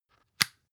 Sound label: spice jar close bright; industrie wirtschaft; gastronomie